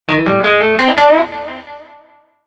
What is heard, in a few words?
country